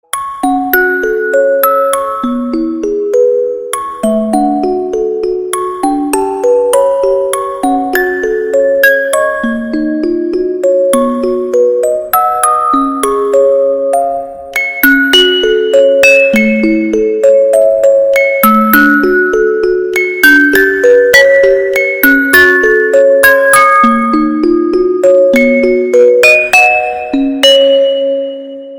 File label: music box ringtone; BOTW